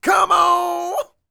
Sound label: Vocal Planet R&B & Dance; ILIO